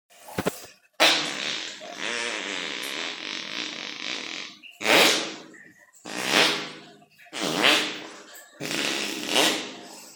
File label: fart